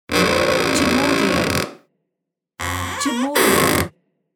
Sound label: Creaking Door